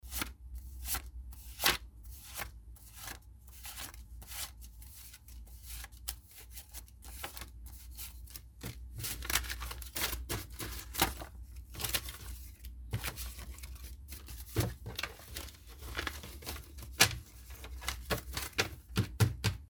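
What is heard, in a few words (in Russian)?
когда отсчитывают по одной купюре и кладут на стол; Звук купюр, долларов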